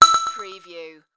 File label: Digital Chime Echo Engage